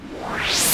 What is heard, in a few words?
Reverse FX